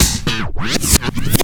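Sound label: REVERSE LOOP, Big Beat; Big Beat Samples & More; AKAI; ALL LOOP KIT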